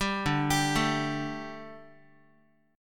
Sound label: D-Suspended 4th Guitar, Dsus4 chord; arpeggiated